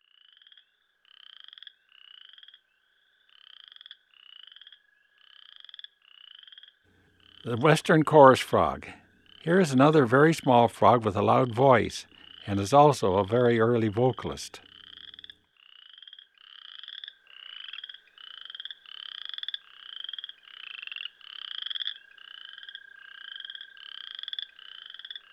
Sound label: Western Chorus Frog; Eastern North America; Dan Gibson; Wildlife Identification By Sound